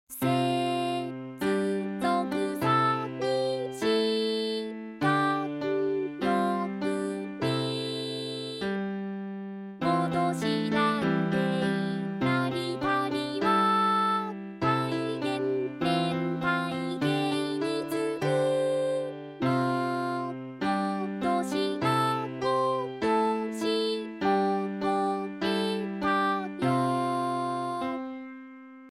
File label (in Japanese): ピアノ